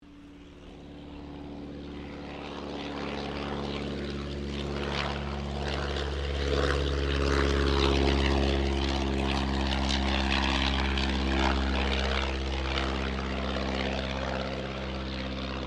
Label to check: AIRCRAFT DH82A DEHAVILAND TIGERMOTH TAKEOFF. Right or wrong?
Right